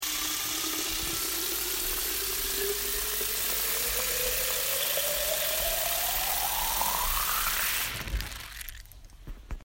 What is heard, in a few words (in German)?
Geräusch